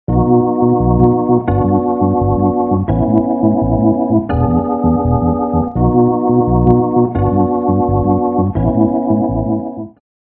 Rotary Speaker, Throbbing Maelstrom, Organ Wet; AmpVibe; Syntheway

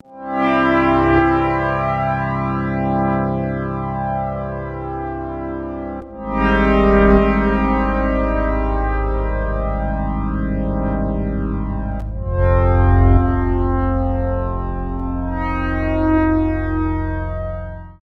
PAD; Phasewaver; Equidistant Paths

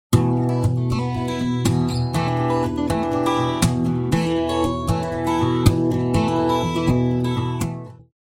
Phaser Whirling Phaser Guitar Wet; Chromacycle; Syntheway

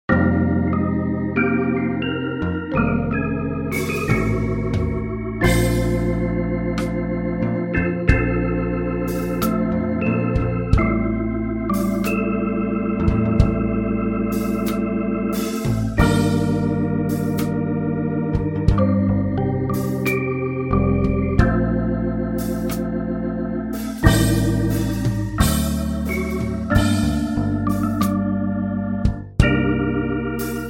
Hammond B3 Soul Jazz; Master Hammond

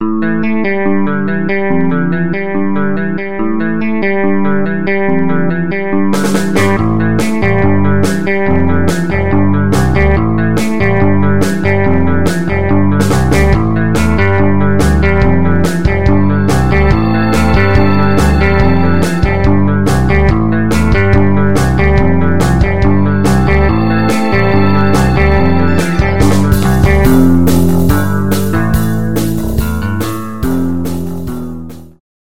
Electric Clean Guitar, Organ; GuitarTempus; Bassesland; Organux; Syntheway

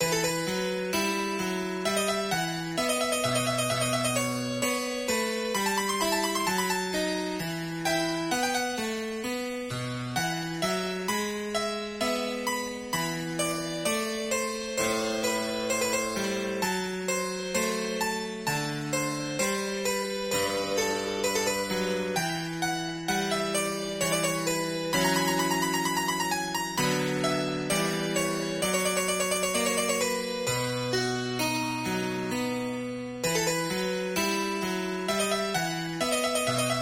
Air Tendre Harpsichord; RetroMagix; Syntheway

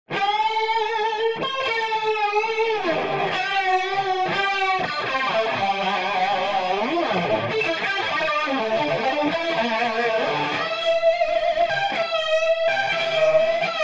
Rotary Speaker Fuzzy Saturator Guitar Wet; AmpVibe; Syntheway

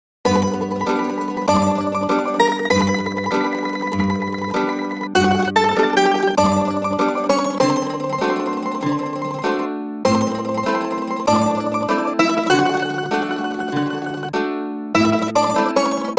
Mandolin Tremolo; Doctor Zhivago; Banjodoline

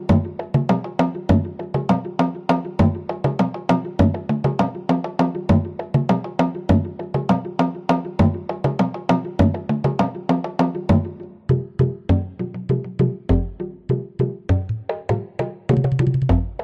Afro Cuban Bata Drum; Drumwavy; Syntheway

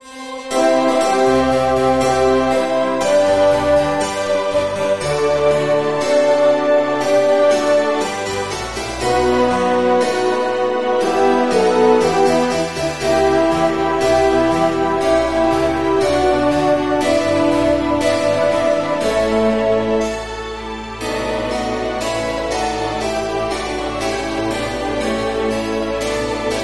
Choir Harpsichord Strings; Magnus Choir; RetroMagix Harpsichord; Syntheway

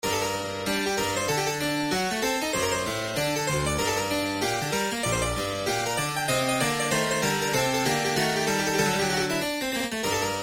Suite in G Major Les Sauvages, Clavecin; Nouvelles Suites de Pieces de Clavecin; Jean-Philippe Rameau

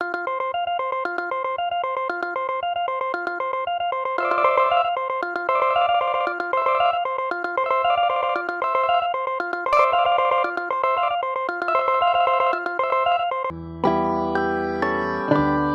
Yamaha CN-70 Organ; Organux; Syntheway

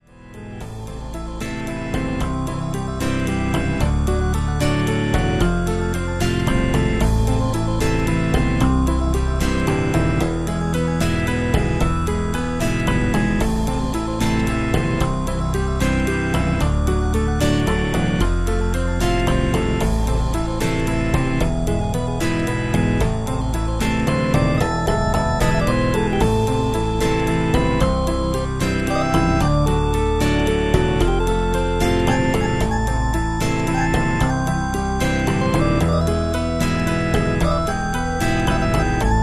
Vox Continental; Harmodion

